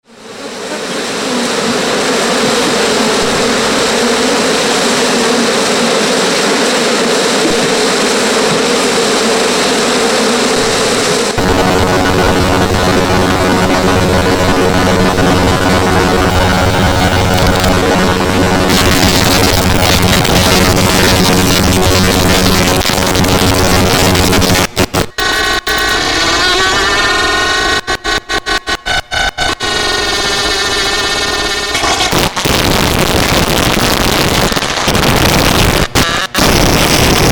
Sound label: CD player, Circuit Bent